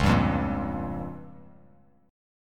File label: Db 6th Flat 5th Bass Standard, Db6b5 chord; Db6b5 strummed; JGuitar